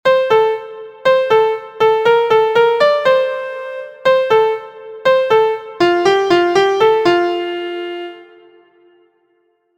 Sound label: MIDI